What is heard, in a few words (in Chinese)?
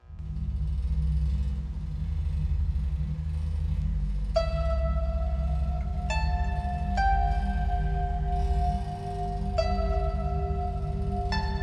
主菜单music琵琶版